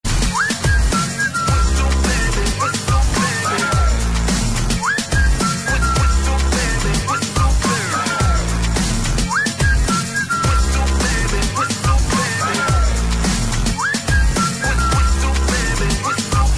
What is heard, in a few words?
whistle